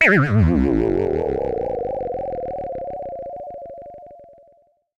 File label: decelerating sci-fi drone